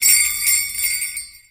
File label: jingle bells; source engine; tf